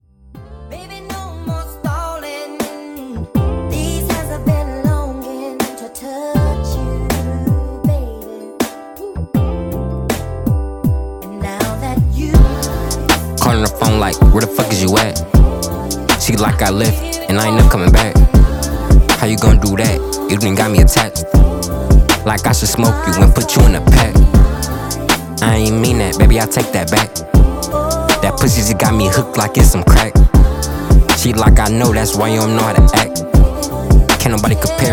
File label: autotune